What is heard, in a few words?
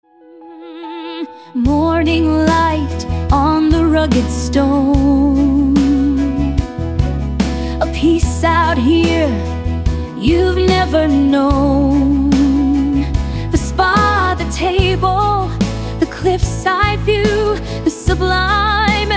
instore loop; cliff house maine